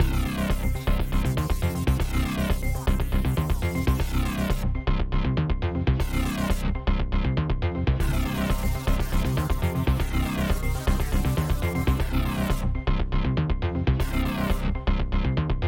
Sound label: nasty groove; soundscrate